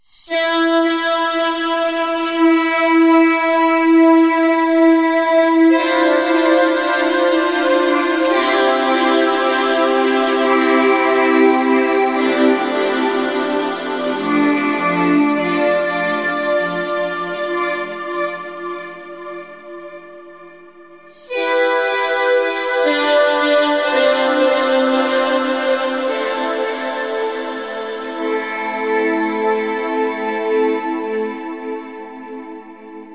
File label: V-Synth formanteer